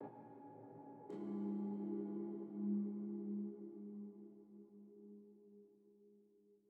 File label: Env Field Day Cave